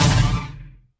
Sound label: hit; junisaber